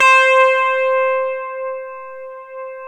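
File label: clean electric guitar; Roland LCDP02 Guitar and Bass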